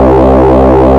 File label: lead; Mono